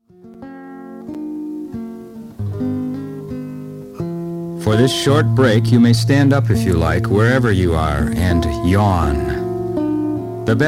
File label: Big Yawn